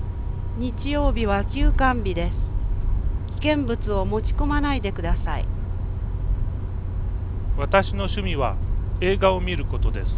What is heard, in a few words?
truck